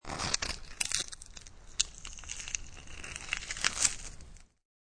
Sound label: Scraping Egg, Scraping boiled egg out of shell.; Kitchen; Household